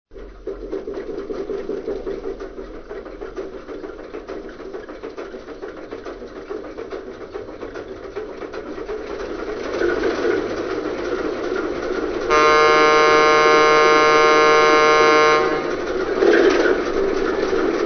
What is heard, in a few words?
Alco RS1